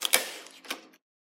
stapler; soundscrate

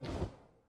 cloth drop; soundscrate

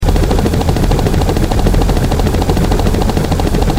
helicopter; soundscrate

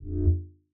mid space ship short fly by; soundscrate

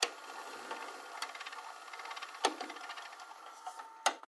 VHS forward

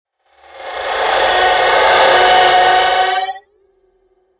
Digital Scream; soundscrate

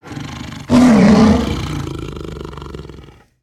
tiger roar; soundscrate

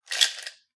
metal stamp; soundscrate

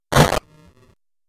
computer glitch

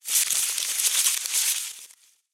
ruffled leaves

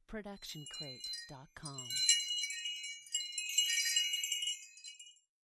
Wind Chimes B

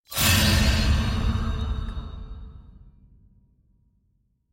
big sword impact

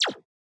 scifi weapon synth blaster

